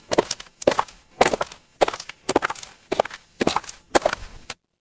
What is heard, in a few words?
footsteps gravel